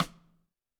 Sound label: Snare, Roland TD-20